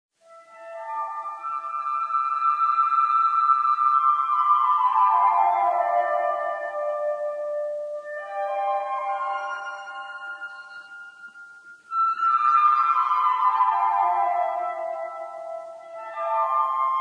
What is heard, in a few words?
Flute; Rogers